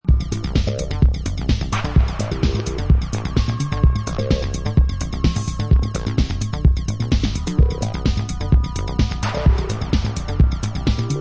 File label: New Beat remix; Out Of The Box remix